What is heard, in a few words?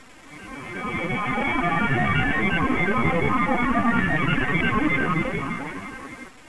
New Gate; Mythos